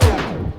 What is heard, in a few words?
FX; AMG Megabass REMIX! VOL-2